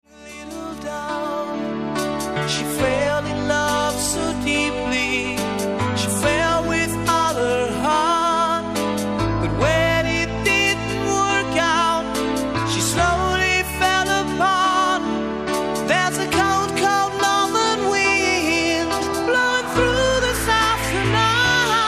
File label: a southern song